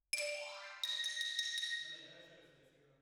Xylo

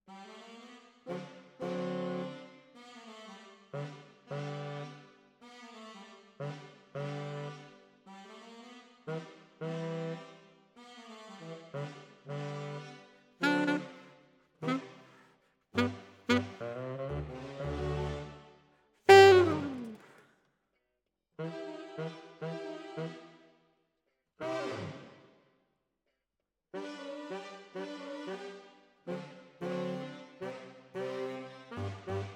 Alto, RNR for piano

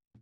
SNR Top

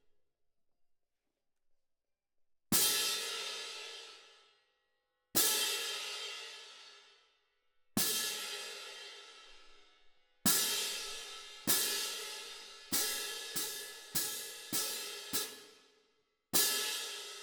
Cymb Close

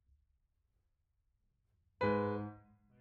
Piano B; Piano Workshop Rec

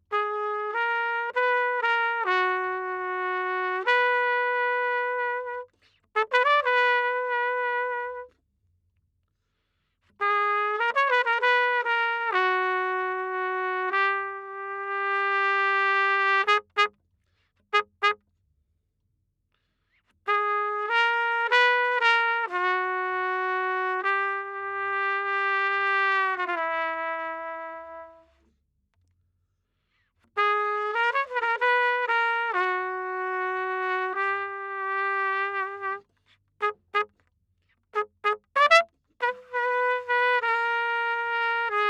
Trumpet

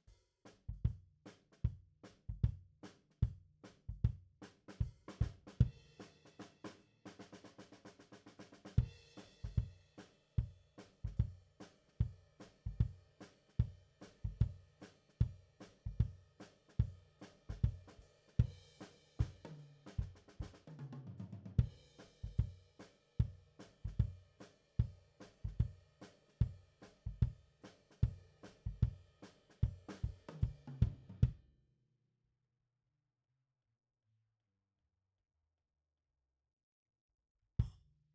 Kick out, Ragtime

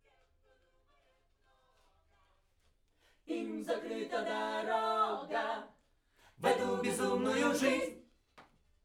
Backs SCHOEPS

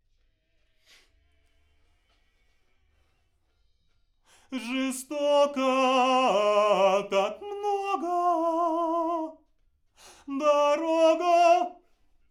Backs AKG RIGHT

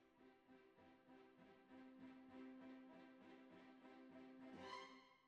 Cbasses

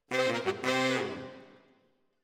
ORTF SCHOEPS, piano

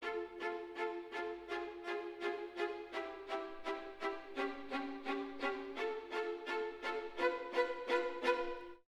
Orchestra, Jazz for piano

